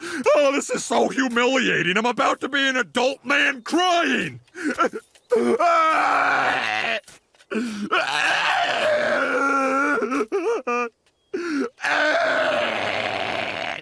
crying

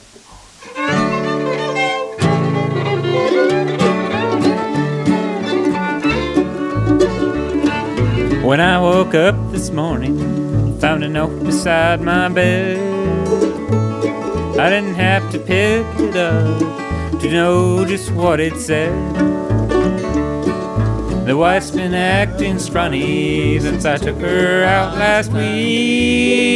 Gtr Pick

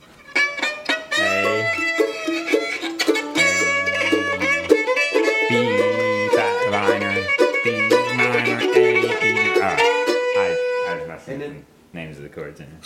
CHORDS; SALT SPRINGS

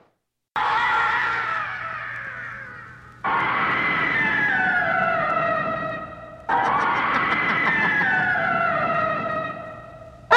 deamonic, laugh

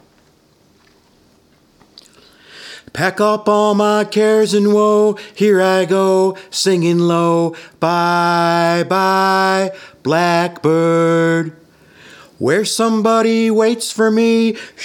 Vocal Take Amp Comp

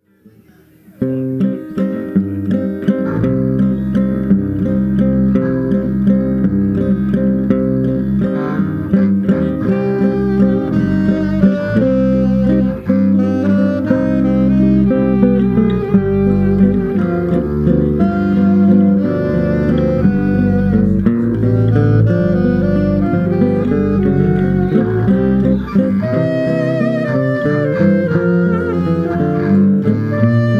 WALTZ

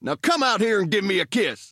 kiss

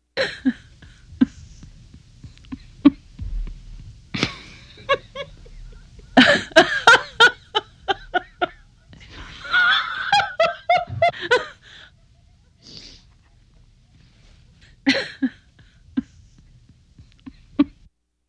woman short, laugh

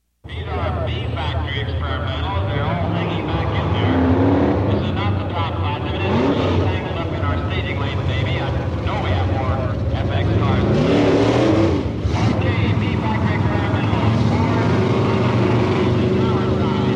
Dragrace; Trains-autos

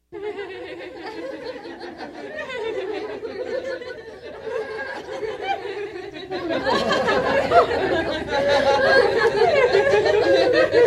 girls nice, laugh

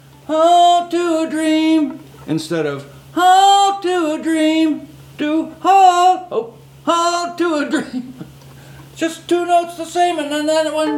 Hold To A Dream Harmony

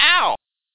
ow short

